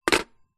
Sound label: household telephone vintage rotary style hang up receiver; zapsplat